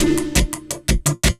LOOP, Drum & Bass, 170 BPM

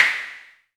noise fx; Masterbits; Switch FX

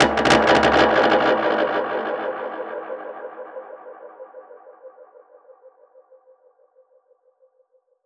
Perc Hit, dub percussion

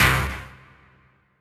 HOUSE, House Dance

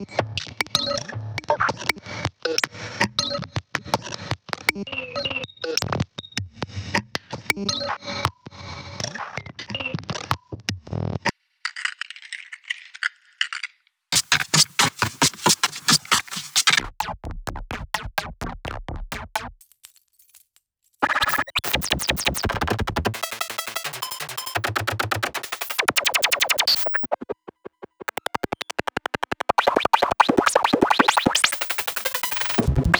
percs glitches and layers

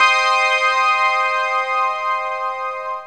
pad, Dance Synths

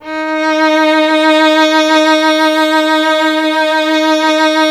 violin, Solo Instruments; Miroslav Vitous; Solo Instruments VOL-2; Solo lnst. II

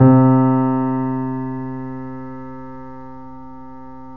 C, 3-D Audio Collection, 3D Pianos, Yamaha C7; E-MU Producer Series Vol. 5

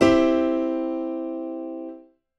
Grand Piano Chord; Big Fish Audio; OSH Chords

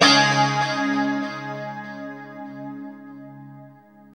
2CHD C#4, Guitars; Spectrasonics; Hans Zimmer Guitars VOL-1; JC-SHEEN

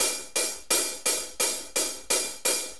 4-4 HiHat Loop

